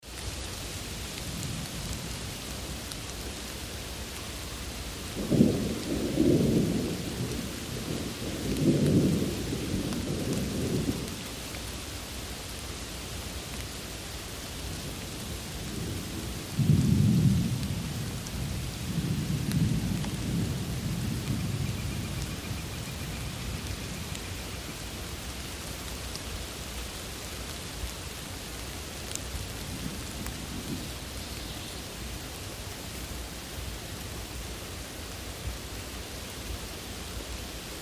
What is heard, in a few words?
Rain Shower Patter On, ext